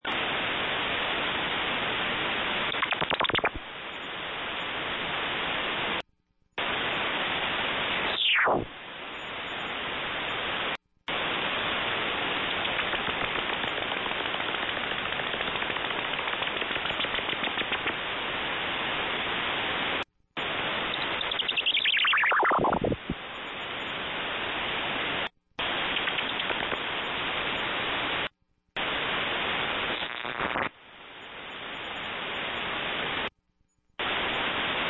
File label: Sweepers